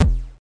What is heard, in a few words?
c64 sid kick, Commodore 64 8bit SID Drums; LO FI